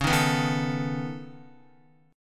C sharp 7th Suspended 2nd Sharp 5th, C sharp, down, Guitar; strummed; chord